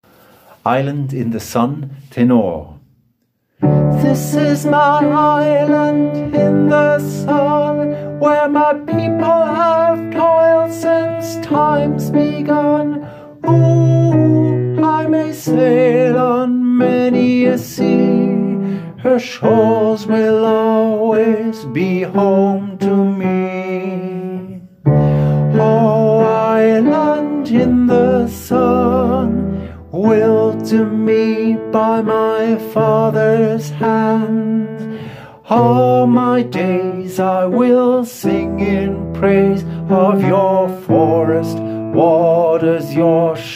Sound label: Tenor